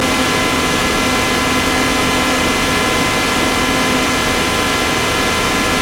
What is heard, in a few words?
Truck Chevy Loop RPM 6000 Engine Perspective